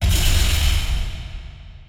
portal open

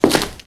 Concrete footstep; starbites

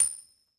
Coin Flip

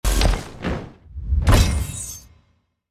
Skill Provocation

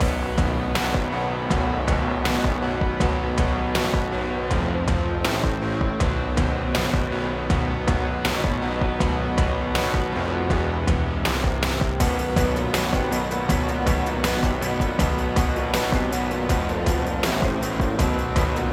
Castle Battle Loop

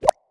Grab

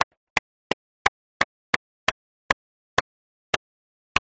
Athletics Crowd

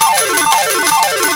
Cash Large

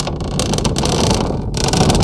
Laddercar Walk; Knight Online

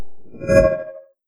Artefact trigger

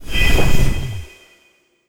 Magic Flame Of Light

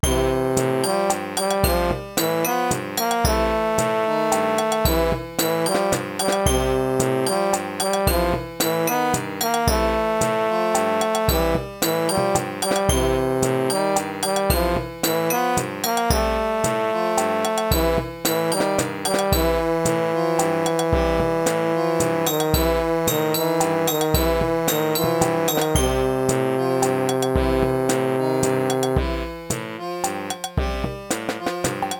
Ending; zoo keeper; Nintendo DS

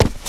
Footstep Run

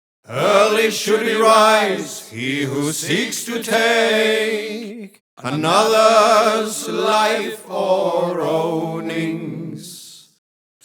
vocal chorus

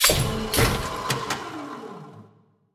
Circuit Tinkering; battle suit aces

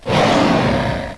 Smilodon Struck; Knight Online

